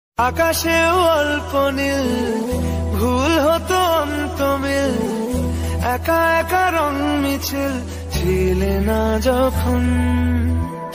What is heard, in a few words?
Bengali Song Ringtone